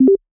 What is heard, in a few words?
unmute Mic